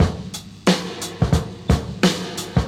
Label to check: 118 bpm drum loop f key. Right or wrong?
Right